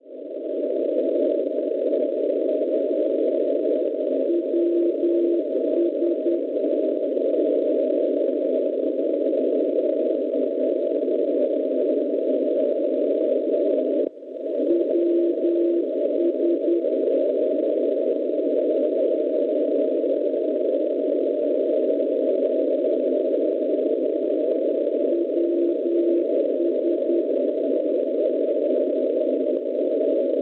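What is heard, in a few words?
NDB non-directional beacon, 291 kHz; Grenoble; Francia; Schwarzsee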